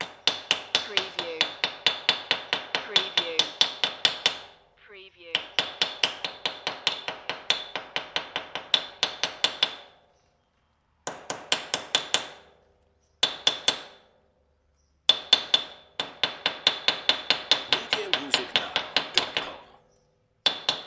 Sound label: Hammer Metal Floor; lynne publishing